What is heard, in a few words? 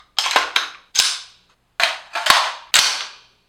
M4a1 Reload